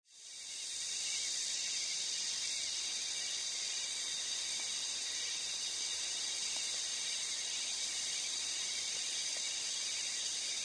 Ambience Jungle Night, Exotic jungle ambience night time. Crickets, insects, distant frogs; Exotic jungle ambience night time. Crickets, insects, distant frogs form an exotic, subtle rainforest ambience; Jungle Sound Effects